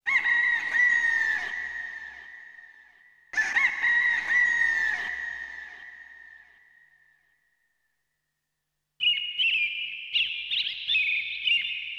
AMBNT 160; Ambient

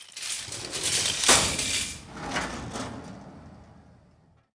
door cart, commercial; PC Gamer IT CD 40; zones

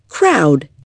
crowd

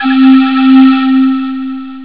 calliope, acid

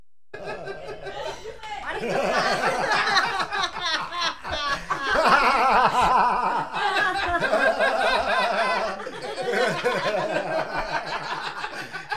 laughing

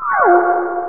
Hit; Realmz 5.1.6

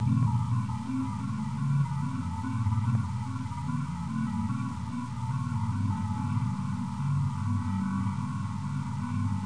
STARTUP; DAEDAL12

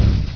Small Explosion; Retro Gamer 16; PC Retro Games

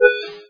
signal; NetStumbler

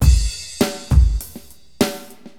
Drums, AcidJazz Funk

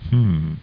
MMMM

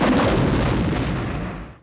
Explode

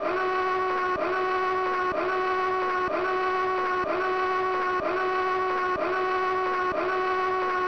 ALARM; equalizer-bbs-collection; DEMOSCENE

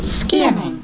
SCANNING

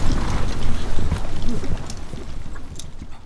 horse

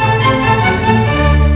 lightning storm; Win95

